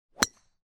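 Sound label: hit of a ball in golf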